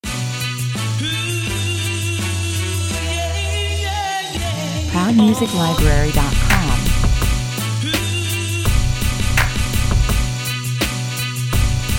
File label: hip hop chant 84 bpm